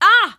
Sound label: hurt; Half-Life 2; alyx